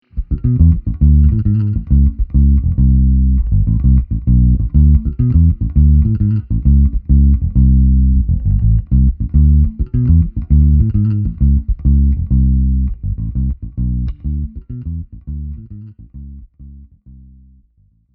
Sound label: Clean